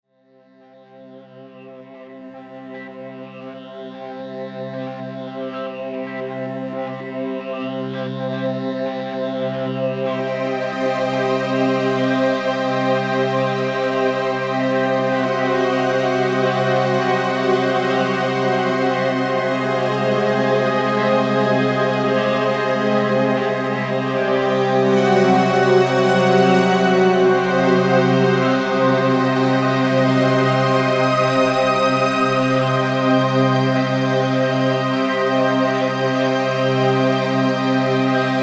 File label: D50 ambient strings